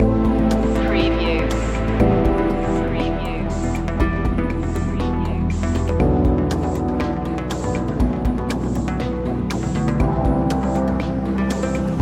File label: calm shores